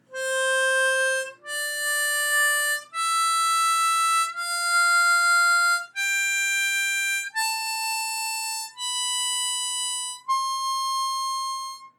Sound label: C Major Scale, harp